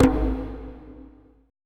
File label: TOTEM, ETH DRUMS